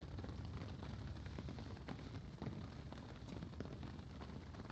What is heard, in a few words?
Ambience Loop, outdoor